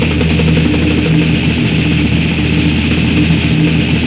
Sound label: GTR; Roland - LA Composer vol. 2